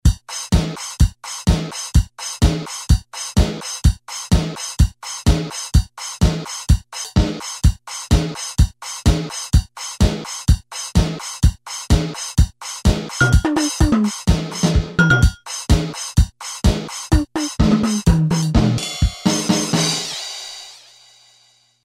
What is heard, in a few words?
Disco, Casio CSM-1, Rhythm Patterns